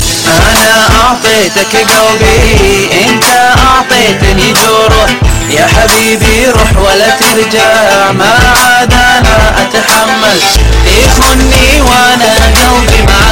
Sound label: Arabic Sad